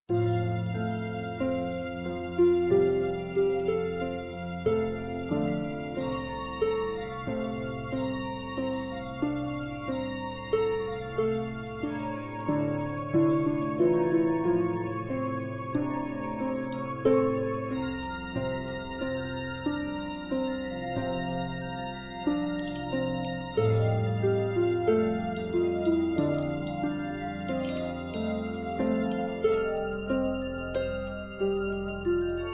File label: Qin River Birds konghou, qin